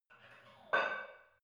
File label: barbell light plate put on hit reverby soft; sport freizeit; fitnessstudio